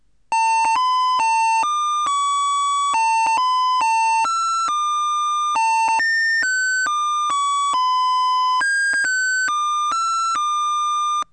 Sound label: Happy birthday, Melody song